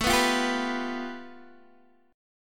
A 7th Suspended 2nd Sharp 5th A Guitar Open D, chord